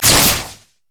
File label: lightning rush; LoL; kennen